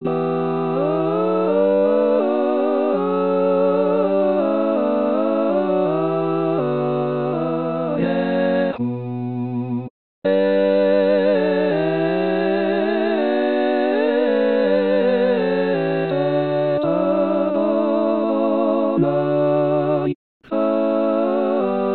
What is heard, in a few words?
barechu playback